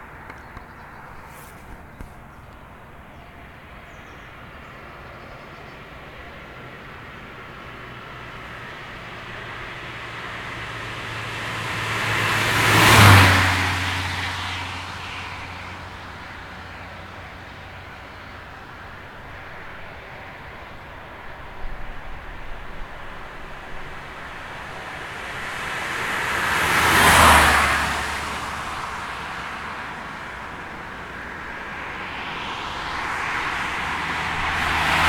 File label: cars passing at fast road; Recorded from immediately beside a B or C road, allowed velocity about 80 km/h. The recording was done about 6 or 7am in the morning